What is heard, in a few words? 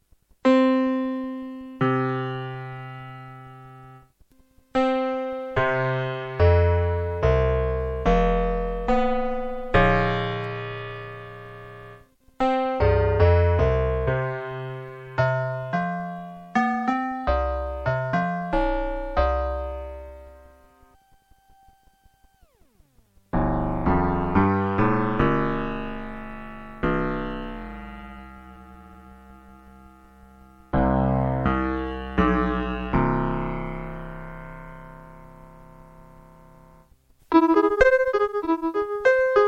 XR1 E 227 Piano